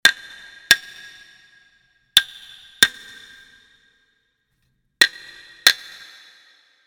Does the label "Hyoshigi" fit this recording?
Yes